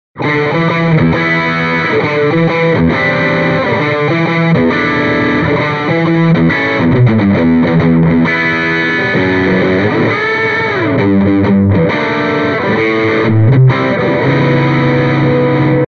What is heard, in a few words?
Celestion G12T 75 Distortion Stratocaster